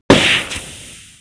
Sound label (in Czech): pneu; Mafie 1